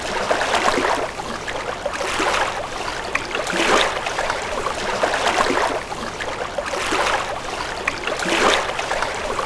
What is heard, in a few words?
water lapping; Fur Fighters